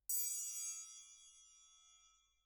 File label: Perc